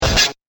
Thermal Laser Discharge; Laser Sound; science fiction sound effect

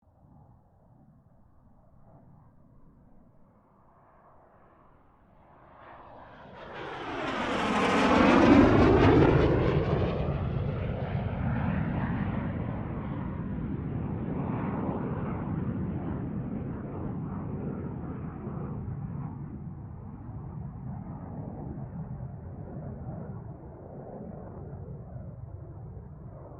AIRCRAFT CA-27 SABRE PASS STEREO; CA-27/F-86 Sabre; Airplane; Airplanes; Vehicles

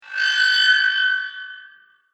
Alien Warning Sound

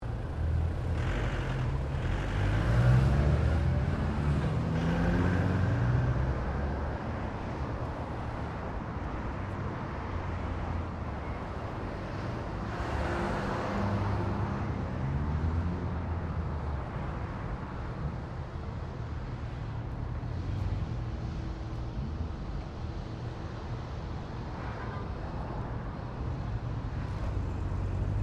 Traffic General Ambience; General traffic sounds; Traffic and Cars; Foundation4

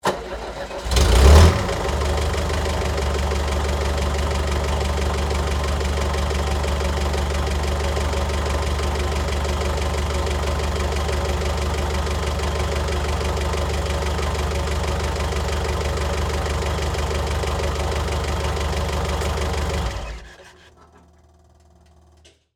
Forklift Diesel; Construction Vehicle Sound Effects

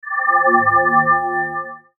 Logo Computer Warble; Sonic Logo; Blastwave

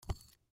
Bowl Set Down, Bowl Set Down Glass Table Dish; Soft; Household; Dishware; Blastwave

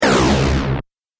Laser Engine Shut Off

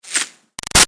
Arrow By Airy Fast; Airy Shimmer; Bows and Arrows; Combat; Big Room Sound

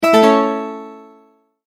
multimedia tone, Goodbye

